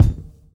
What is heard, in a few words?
BD; Best Service; Dance Mega Drums